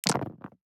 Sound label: DICE on Neoprene, Throw and Roll, Standard, 6 Six Dice; Samples; ArticulatedSounds; DICE Sfx Party (Extended)